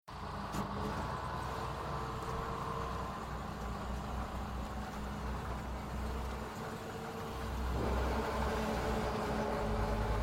Industrial Mechanical Agriculture Potato Harvester Start Run Stop; Tractor Sound Effects